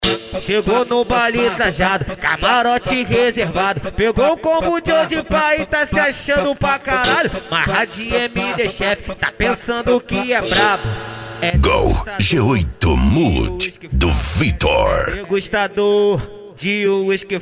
funk ritmado

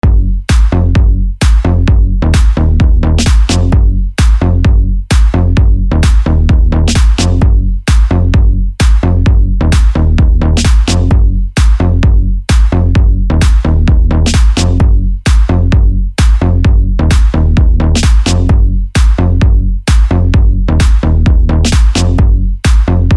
base mega funk electro house; pack bases mega funk 2020

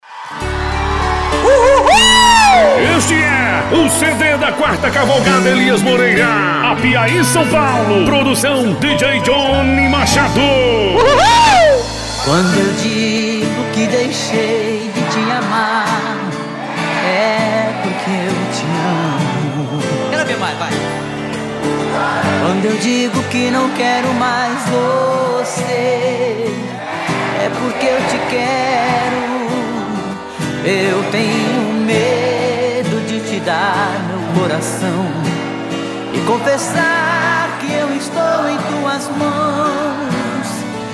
sertanejo classico

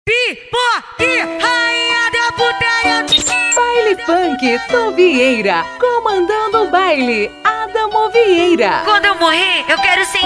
baile funk

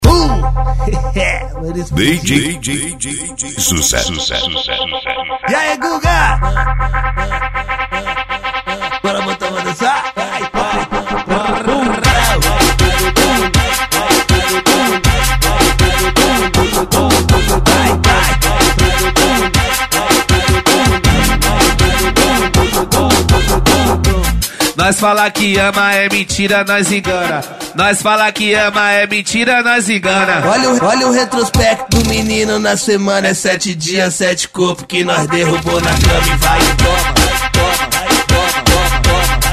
funk e rap

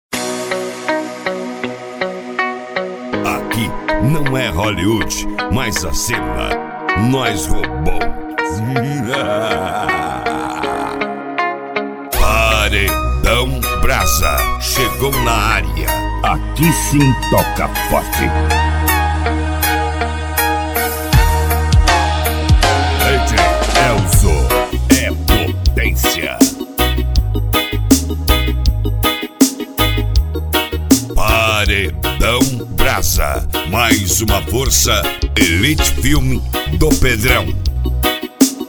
passagem reggae; cd paredao brasa

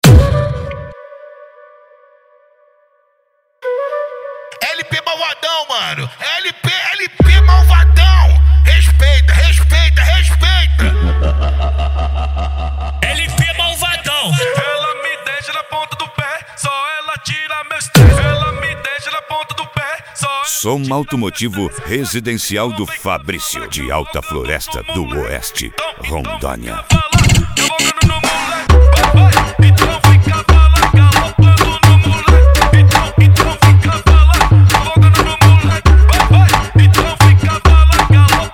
som automotivo funk bass

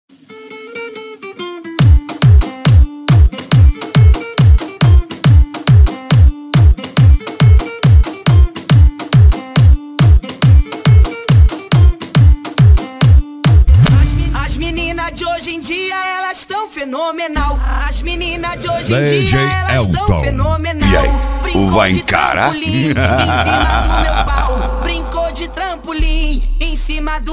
eletro car automotivo

